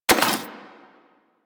Rifle Mech Alt; Weapons; Warframe; Thanotech

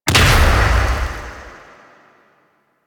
Scarab Swarm Burst; Warframe; Mummy

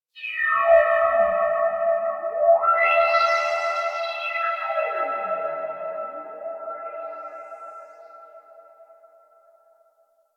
Rift One Off; Warframe; Magician

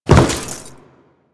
Step Default, Footsteps; Warframe; Grineer

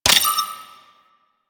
Tower Lock Console; Warframe; Bard Quest; Orokin Tower

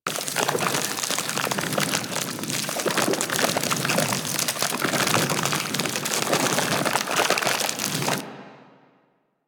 Adhesive Loop; Enemies; Abilities; Warframe; Corpus; Arachnoid Hunger

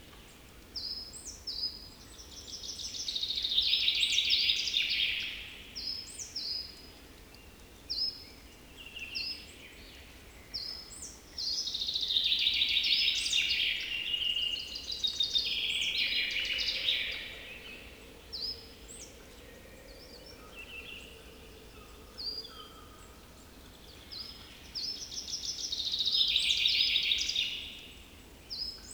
Wetland Forest Day Amb Loop

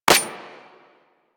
Fire Mech, Weapons; Lato Redux

